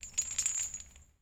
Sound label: Chain Move Slow